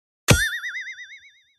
cartoon silly

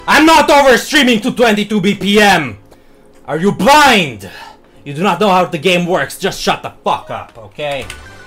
222 bpm

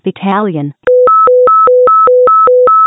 battalion tones

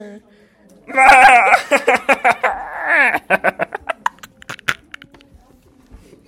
laugh

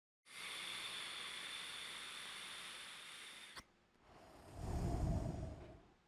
vape

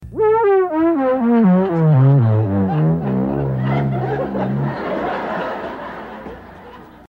fail; bruxa do 71

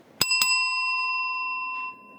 bell rings twice

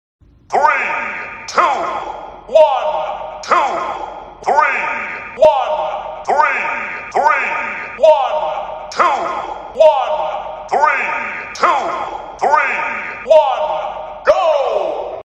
countdown error; smash bros

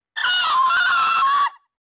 possum screech; panic the possum

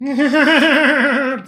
heng heng ha ha ha ha ha

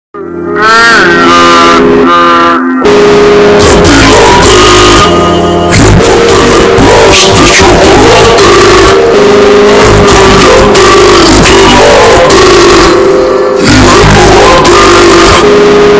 slowed bass boosted

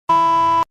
beeping; after work

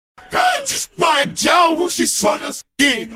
rapper chorus

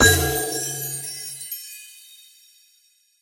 chimes

